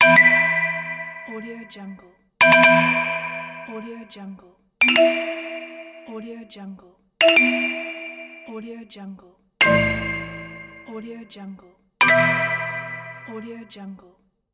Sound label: vibraphone alert set